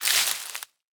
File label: Leaf litter step; Ściółka